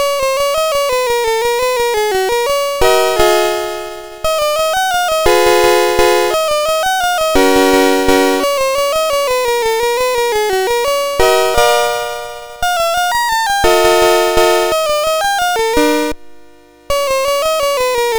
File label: Walzer, sid MOS6581R2; Music Shop